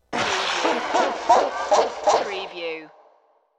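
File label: Psycho Laugh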